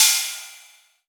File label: Rides, Cymbals; Vengeance - Essential Clubsounds Vol.5